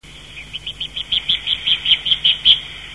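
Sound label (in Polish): jerzyk widłosterny - Apus caffer, głosy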